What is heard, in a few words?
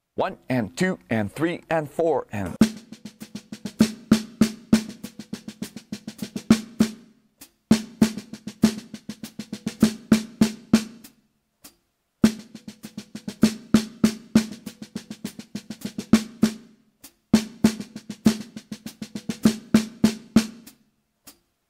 Melody 16th Notes